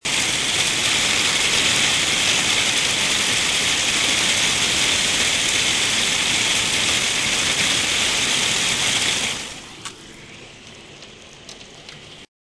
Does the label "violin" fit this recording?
No